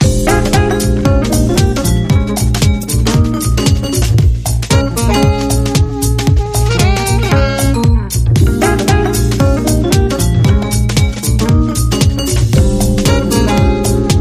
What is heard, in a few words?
Jazz, Inst